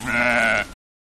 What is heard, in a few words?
Sheep Baah Close Up